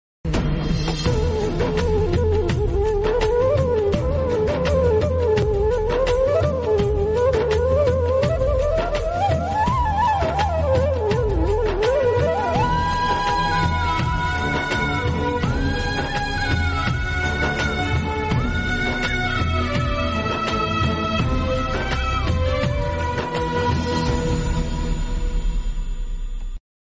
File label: sad ringtone, flute theme bgm; bhootnath